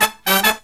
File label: Killer Brass Riffs, SOUL